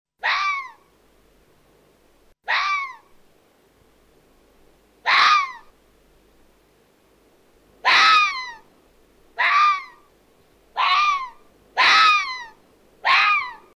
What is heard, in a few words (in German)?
Rotfuchs